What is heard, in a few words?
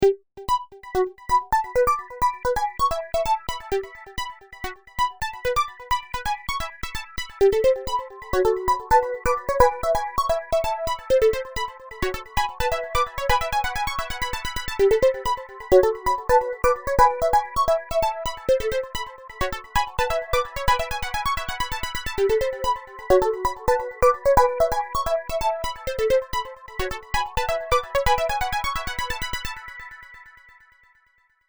ringtone